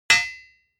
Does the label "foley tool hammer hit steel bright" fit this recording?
Yes